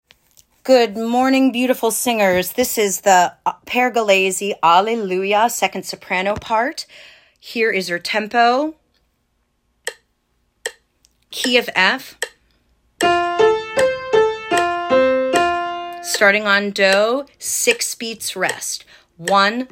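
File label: soprano alleluia slow on solfege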